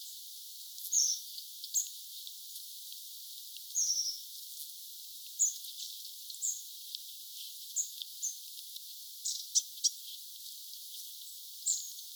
pyrstotiaisten aantelya; vähän pyrstötiaisen ääntelyä

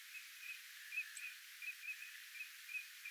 pikkusirkku ilmeisestikin haarapääskysen pesäpaikalla äänitetty; pikkusirkku tietääkseni

